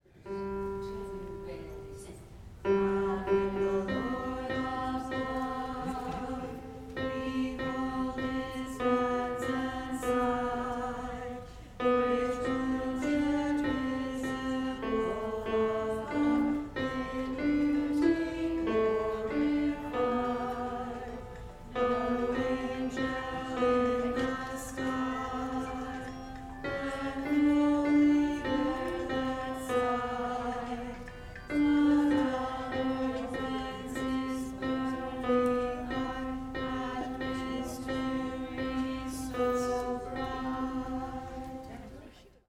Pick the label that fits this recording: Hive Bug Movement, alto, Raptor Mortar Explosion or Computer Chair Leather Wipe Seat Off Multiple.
alto